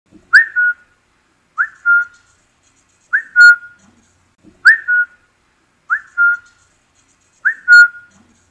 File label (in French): sifflement de rappel